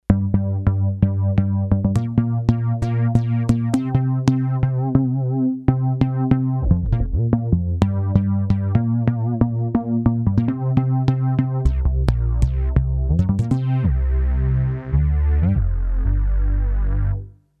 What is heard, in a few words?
Round Sound